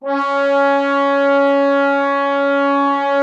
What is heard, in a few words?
trombones, C#3; Best Service ProSamples vol.51 - Classic Orchestra 2